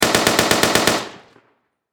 Warfare Machine Gun 7.92 Caliber Mp44 Stg44 German Long Burst Distant Perspective